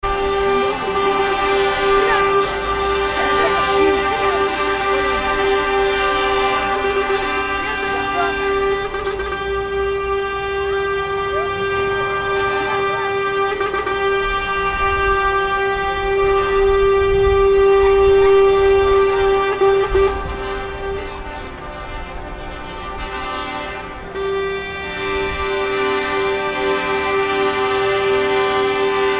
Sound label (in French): claxons